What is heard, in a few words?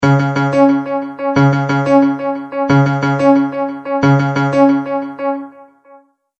Multimedia Tone-Thinking/Processing; Tones; Sound Design; McKinney Sound